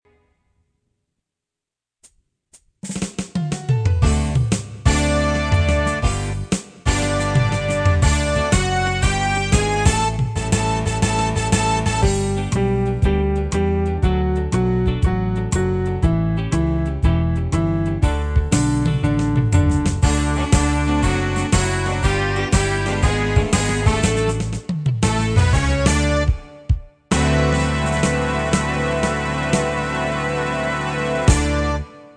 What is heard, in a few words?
Going Down the D String